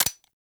Viper Fire Mech, Weapons; Warframe; Vipers

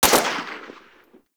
Fire Mech; Warframe; Braton MK One Redux

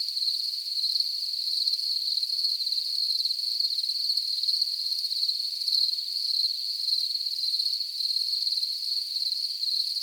Night Insects High; Ambience; Props; Warframe; Eidolon Plains

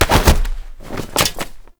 grab and choke foley; Ostron; Warframe; The New War

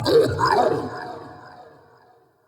Cannonball Slam Vocal; Powers; Bromius

